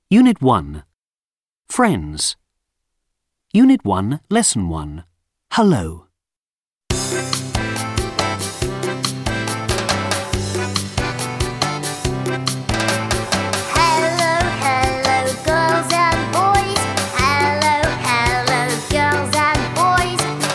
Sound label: Hello song